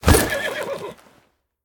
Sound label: activate; Smite; god Lancelot